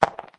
dice end; Arcane Quest; android game

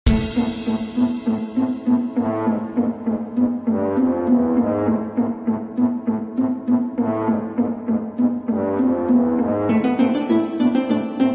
Music Dark Cave; Candy Thieves Tale of Gnomes

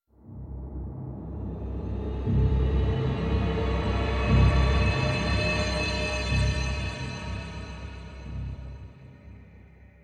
Stinger Swell Long

